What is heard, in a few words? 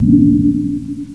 gun pickup